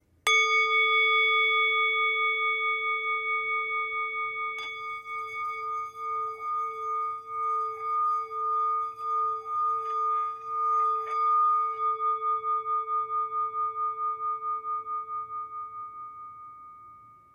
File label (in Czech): tibetska misa